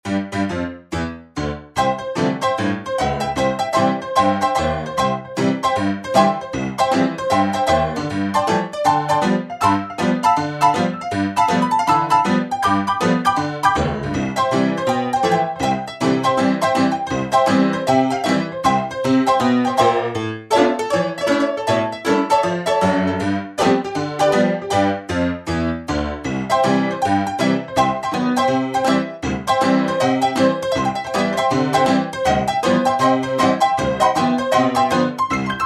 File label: Really Honky Tonky